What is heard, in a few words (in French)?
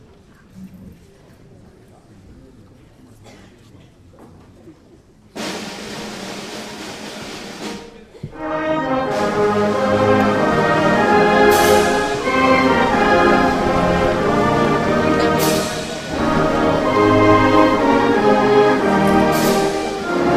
Hymne américain